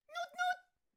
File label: Fan Bearer Attack Vocals